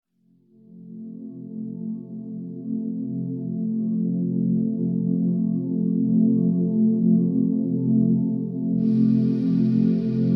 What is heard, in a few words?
binaural theta waves